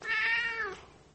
country; shockwave; fixitupkatesadventure

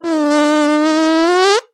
fart

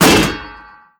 shield heavy bash; Skyrim Special Edition

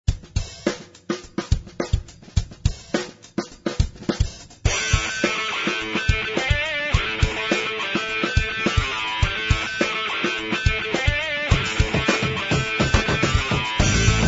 music; Flashpoint; shockwave; driftnburn365

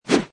swoosh

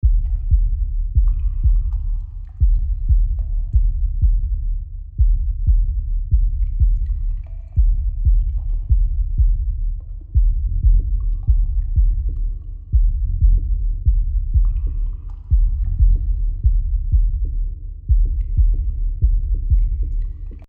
bathroom loop; curiouslystrongallnightlong

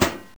hit; madcars2; radicalplay

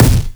gun; madcars2; radicalplay

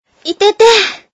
damage

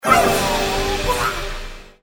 Combo Boom; Kung Pow

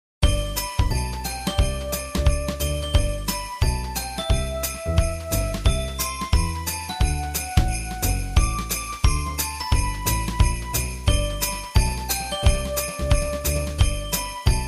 christmas, music, winter; Flashpoint Ultimate 13; pandandago

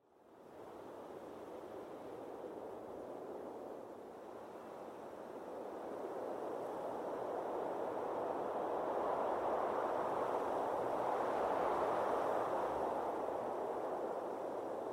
wind

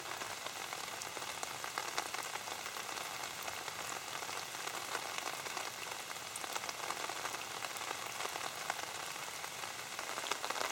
rainy walk

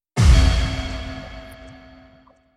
blueprint find; pond5

